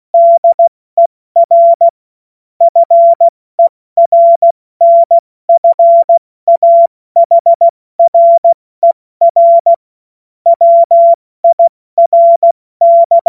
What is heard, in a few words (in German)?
Witz, Morsekurs, cw morsen